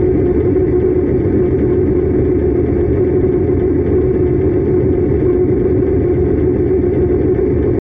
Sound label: engine in warp